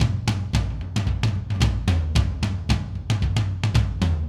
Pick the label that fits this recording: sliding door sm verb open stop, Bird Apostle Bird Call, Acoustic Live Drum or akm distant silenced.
Acoustic Live Drum